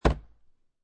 Laundry Bottle Set Down, Household, Laundry Detergent Bottle, Set Down On Wooden Table